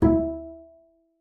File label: E4, bass pizz; Xsample VOL-5